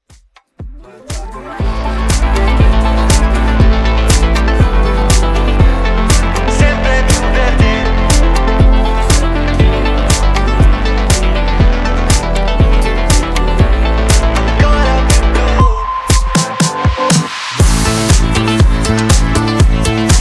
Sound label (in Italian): Groove Continuo; Sanremo